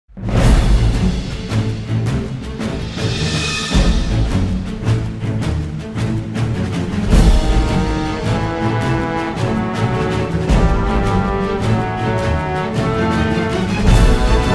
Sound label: Dark Rider (Without Choir)